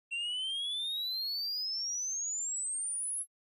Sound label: Laser Warm Up; lasers